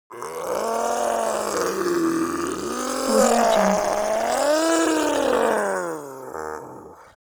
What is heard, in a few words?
creature zombie human change; smartsoundfx